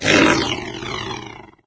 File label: death, doom3 pinky